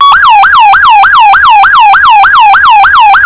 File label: Yelp